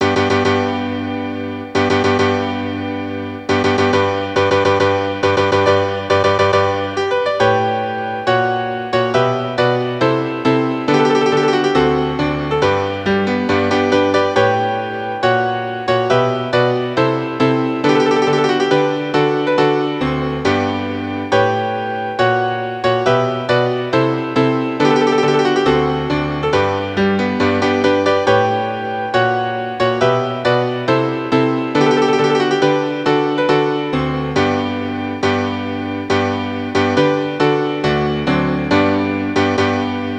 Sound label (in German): klavier solo; hochzeitsmarsch mendelssohn